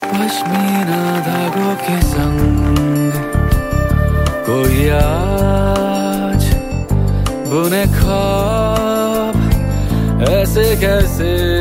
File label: hindi ringtone